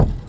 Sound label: Garbage Can Perc, percussion; Hermann Park; HOUSTON